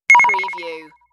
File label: Short Ring